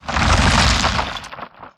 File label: Rock Elemental Underlay Attack